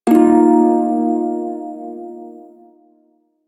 Prime Shawzin Blues Chord I; Music; Warframe

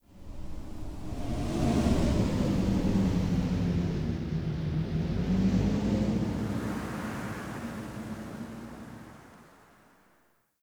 Cave Ocean Wind Gust; Ambience; Midrath Island

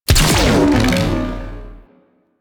Split Laser Shot; Warframe; Corpus

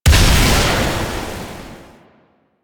Projectile Death Large; Weapons; Warframe; Duviri; Incarnon Adapters

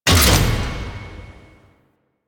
Challenge Complete; Veilbreaker; Kahl

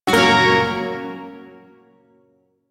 Shawzin Hirajoshi Chord J; Warframe; Duviri Shawzin

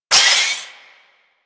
Lantern Power Up Short

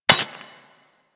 Laser Impact Metal; Surface

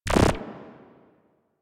Corrupted Notes J; Warframe; Bard Quest; Bard Boss Fight

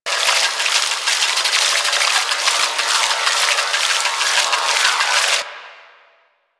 Water Fight Sprinkler Ammo Loop; Warframe

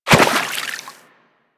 Walk Water, Footsteps; Warframe; Operator

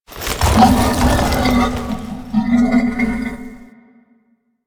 Horse Gallop Brakes; Foley Actions; Warframe; Vehicles; Duviri

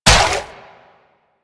Step Water; Warframe; Infested; Zealoid Prelate

